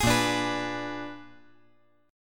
A sharp 7th Flat 9th A sharp down; A#7b9 strummed; JGuitar

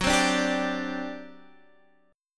G Major 7th Suspended 4th, F sharp

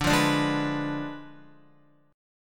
D Diminished 7th D down Guitar; strummed

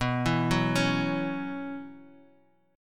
B Suspended 2nd Suspended 4th B, chord

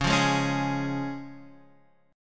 C sharp Major, Guitar Standard; strummed; 9,8,6,6,9,x; JGuitar

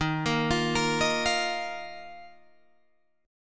Eb Suspended 2nd Eb down Guitar Open G

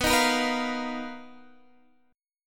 B Major Sharp 11th, B, down, Guitar Standard; strummed; capo=12

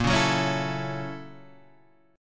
Gb 7th Sharp 9th Bb, guitar

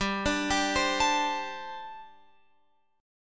G Suspended 2nd Suspended 4th, Guitar Open F; Gsus2sus4; arpeggiated

